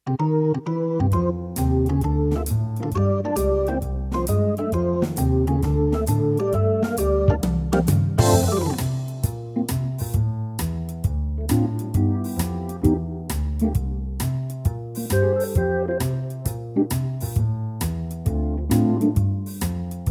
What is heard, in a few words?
F live organ